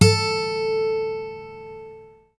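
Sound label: GUI Ac. A2, acoustic guitar; Best Service Gigapack 2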